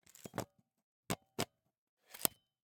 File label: Camera, Pentax KS2, DSLR, mechanical, removing lens, replacing lens, 3 moves, XY; Eiravaein Works; Vaeyan IV